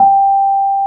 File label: AMB ROAD; Keyboards Old School; USB Soundscan vol.09; AKAI